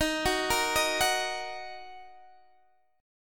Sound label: Eb Minor, Guitar, Open D